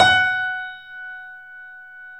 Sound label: 3D Boesendorfer, Pianos, F#; E-MU Producer Series Vol. 5; 3-D Audio Collection